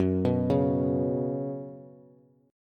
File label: Gb Suspended 4th Gb, down, Bass; arpeggiated; JGuitar